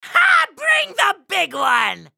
ultimate effort; Ziggs; en US

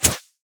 basic attacks; Zyra

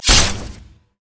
manta strike; LoL; fizz skin04

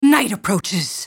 spell cast effort; Diana

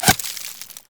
volley; LoL; ashe

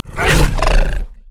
swipe efforts; nidalee base

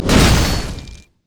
twinbite human; LoL; shyvana

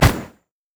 cryophoenix basicattack; LoL; anivia

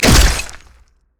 basic attack knee; rengar

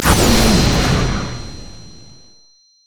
stun; Vel'Koz

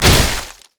basic attacks; LoL; vi

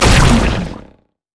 elastic slingshot; LoL; zac base

